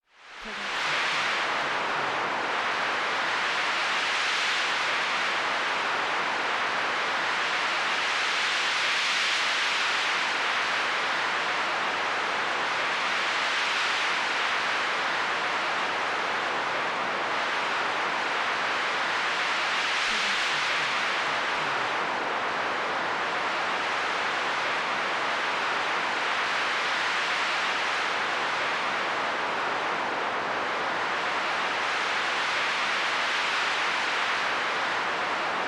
wind jetstream; soundscrate

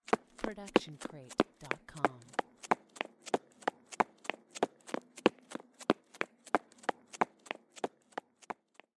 running outdoors

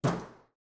Metal Footfall; soundscrate

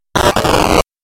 computer glitch

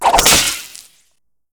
web shooter; soundscrate

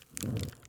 torch swipe; soundscrate

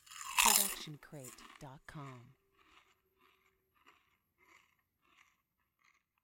chip crunch chewing closed; soundscrate

